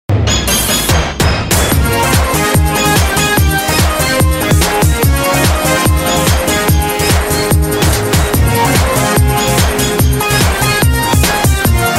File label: music postgame 155bpm; Omori sound replacements